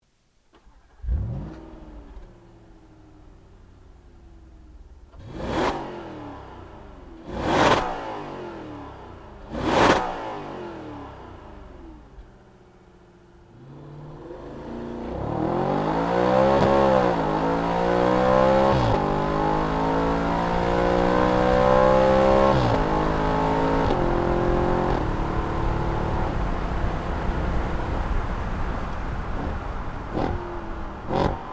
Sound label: Audi RS5